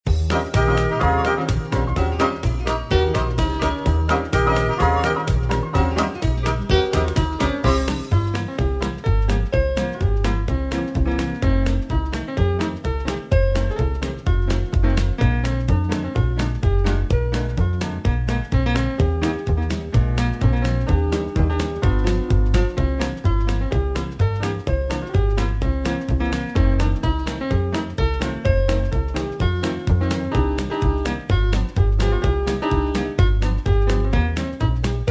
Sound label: music